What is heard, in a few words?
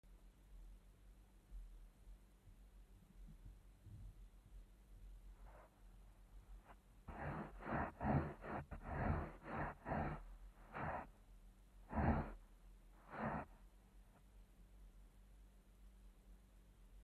Geophone Silence then dragging Foot on the ground